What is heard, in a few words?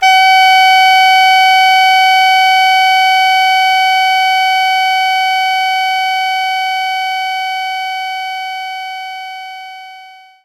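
Alto Sax F sharp 5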